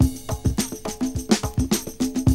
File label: BREAK